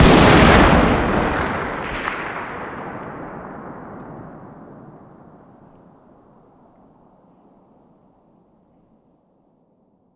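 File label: fire distant 338lm rifle exterior; gmod; arccw uc commons attachments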